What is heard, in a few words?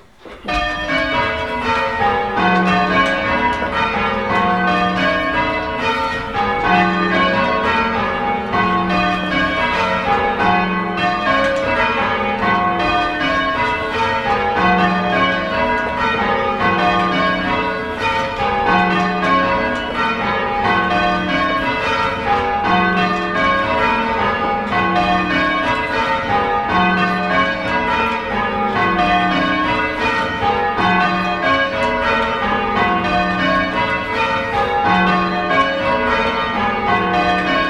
Short Peal; Trafalgar Ride